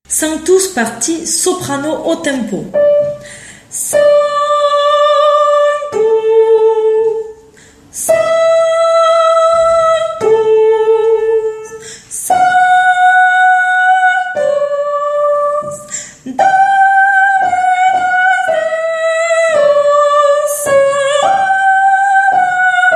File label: Soprano; Requiem; Sanctus; Mozart